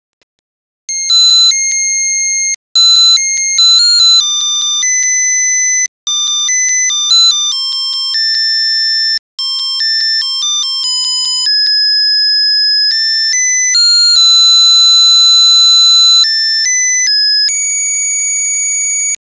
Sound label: Love story, Melody song